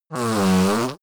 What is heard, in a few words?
yeti nose blow short; kreaturen